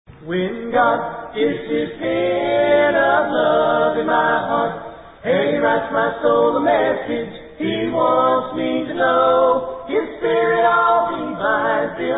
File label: a capella hymn, when god dips his pen